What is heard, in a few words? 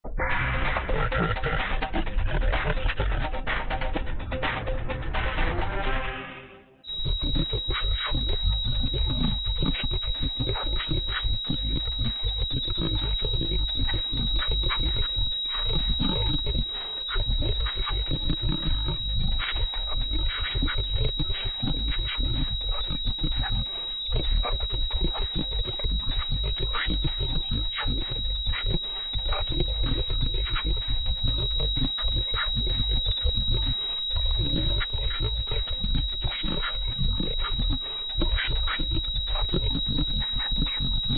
Hz